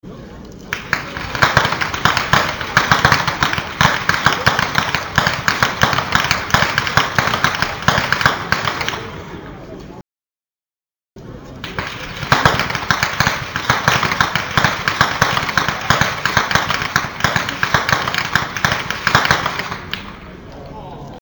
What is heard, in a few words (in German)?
schnalzen, jugend; rossdorf